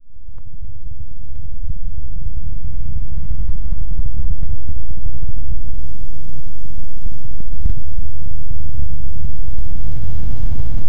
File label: loud cyberpunk robot start, mechanical clicks